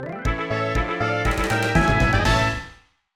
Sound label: Card Game Jingle; Pokémon HeartGold SoulSilver Remastered 2022